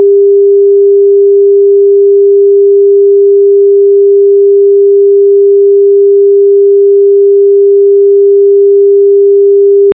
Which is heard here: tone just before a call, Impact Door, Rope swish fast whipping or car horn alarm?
tone just before a call